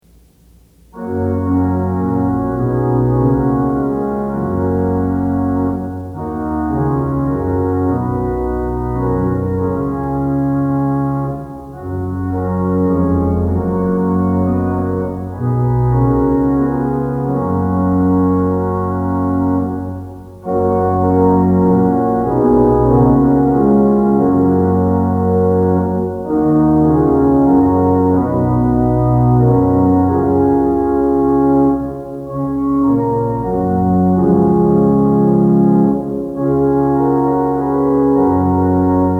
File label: Organ Music for Worship Services; Come, Thou Almighty King; Twenty-Eight Liturgy Hymns